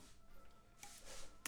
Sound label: flute